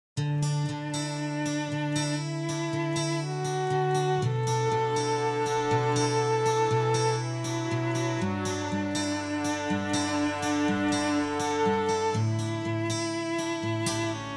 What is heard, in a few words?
Violin